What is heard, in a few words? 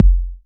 Bassdrum